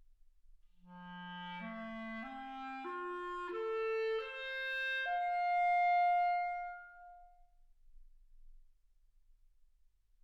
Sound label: clarinet